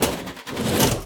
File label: Flip Plat Flip; Mines